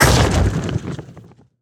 Fireball

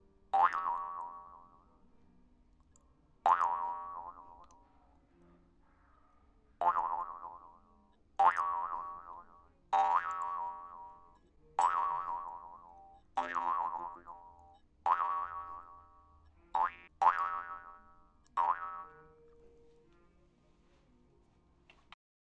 Metal Spring Being Hit

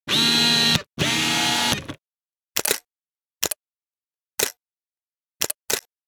Nikon D300

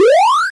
Power Up

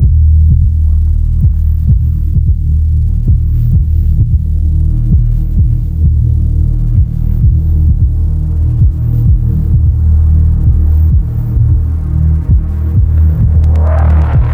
Bass Patch

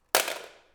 Object falling

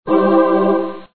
The Choir

